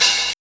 dark open hat sample f key; Duration 0:01; Samplerate 44100 Hz

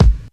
mid heavy bass drum sound G sharp key

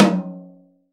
acoustic tom drum single hit f key; tom drum samples

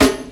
90s natural acoustic snare sound g sharp key; Mono; snare drum samples

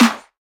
rich low end snare one shot a sharp key

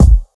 loud steel kick drum sample G sharp key

